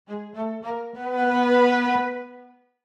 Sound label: Video game reward chest opening string